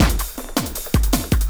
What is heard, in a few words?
LOOP, Drum & Bass, 160 BPM; Ueberschall; Drum & Bass Resonance